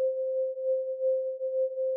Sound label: melody undamaged